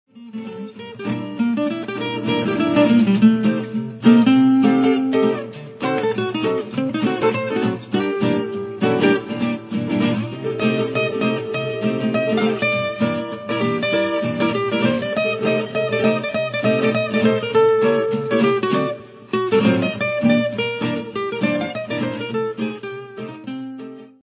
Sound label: minor short